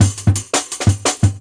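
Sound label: Jungle Breaks, 170 bpm; Broken; Original Jungle Breaks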